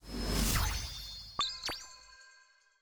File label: UI Event Wisp Animation; bloom minigame